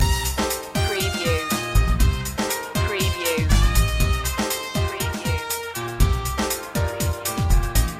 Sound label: latin express loop